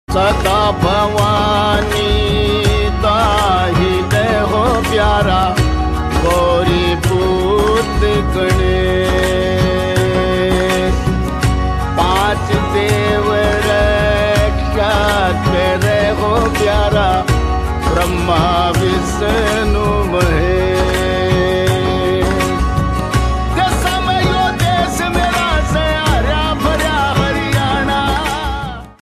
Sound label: ringtone